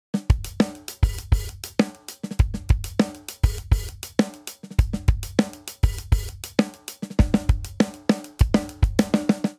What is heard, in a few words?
Linear Funk Chorus 100 bpm; 4 4; MIDI Clips; Ableton; Factory Packs; Drum Booth